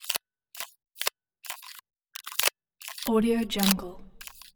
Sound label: levers sliders; soundbits-soundfx